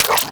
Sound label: Infested Punch Life Steal; Kick Punch; Weapons